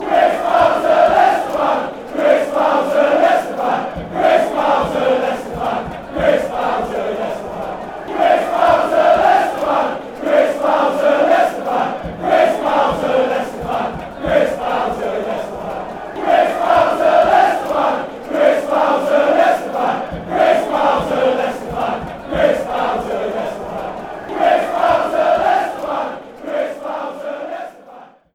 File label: fan; leicester